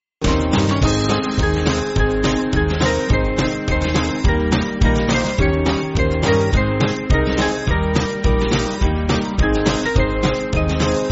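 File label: Music Backing Files for Churches; Brothers Sisters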